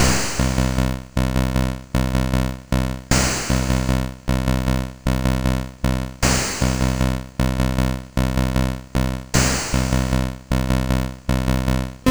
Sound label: Cover Music, sid MOS6581R2; Master Composer